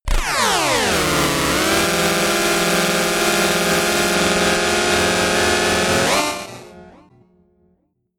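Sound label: slow chord riser, science fiction; sciene-fiction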